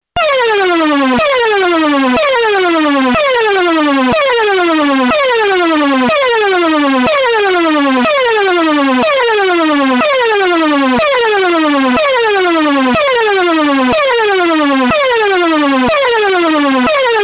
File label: Alarm Special Level 3